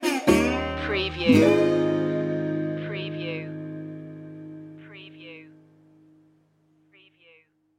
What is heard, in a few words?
tiki beach